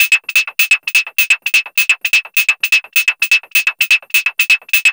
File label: VCS beat; Zero-G Ambient 1; VCS BEATS #1